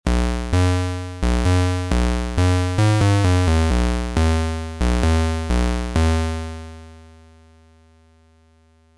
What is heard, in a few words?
micro cosm bass